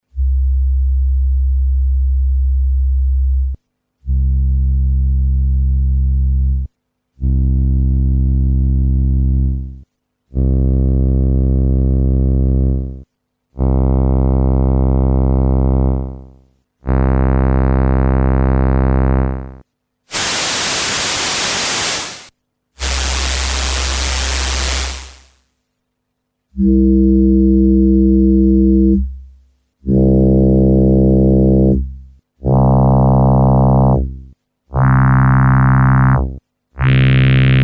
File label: waves